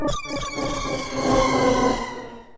tech fx; Best Service; Hyper Dance; EFFECTS

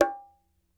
Bongo Low Med, World Percussion One Shots; Sony CS

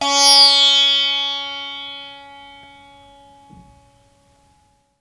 Indian Percussion Tabla; Mango Loops

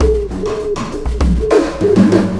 Alien Influence Beat, Processed Drumkits

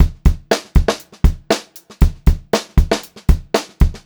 POP BEAT, Pop-Rock Drumloops; 118 POP

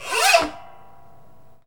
WIND SOUN

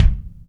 Body Kick

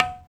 HI TABLA; East West - Phat & Phunky

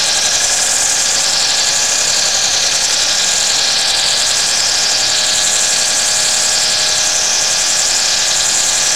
FIRE, GRANULAR, Disjointed Textures; USB Soundscan vol.33; AKAI

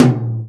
tom, Real Drum Kit, jungle kit

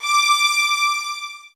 D, soft violins; Q Up Arts; Denny Jaeger Master Violin Library

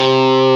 GTR Tube, Dirty; Club-50 - Foundations Roland

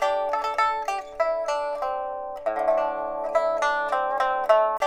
PIPA, 90; INIS - Opium; PIPA 100-C

